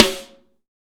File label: SNARES DRY; Real Mega Drums